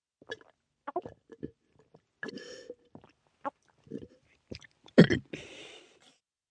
Drinking Sip Burp, Drinking, swallowing, 1 child, gulp, burp, human voice, kid